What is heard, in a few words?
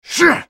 spellcast effort; Talon; en US